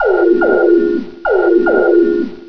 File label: pickup